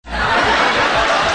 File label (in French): rires